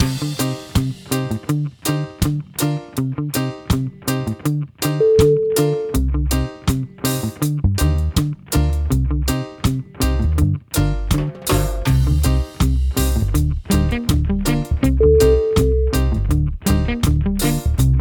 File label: groovy reggae